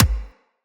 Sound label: Weapon Punch Pistol